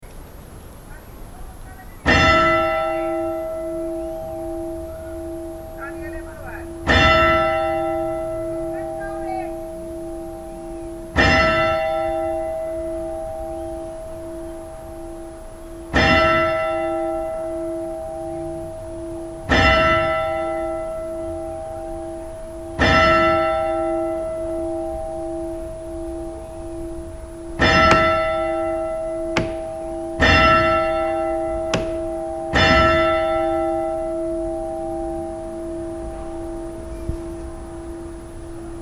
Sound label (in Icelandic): grundarfjardarkirkja likhringing; Í turni Grundarfjarðarkirkju eru þrjár kirkjuklukkur. Klukkurnar sveiflast ekki; vesturlandsprofastsdaemi